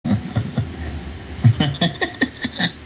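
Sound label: weird laugh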